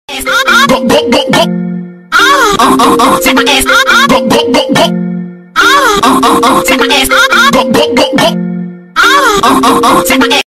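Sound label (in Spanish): notificación iPhone; android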